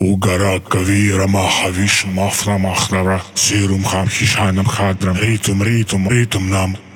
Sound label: 138 Shamanic Voice (Wet), Psytrance; Shamanic Voices